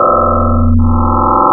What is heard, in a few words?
form, wavetables, Classic Synths